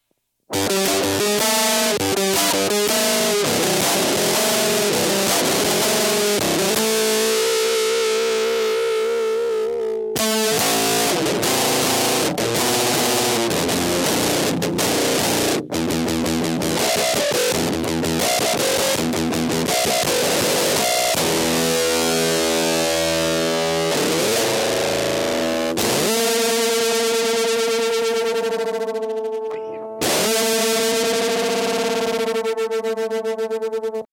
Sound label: bass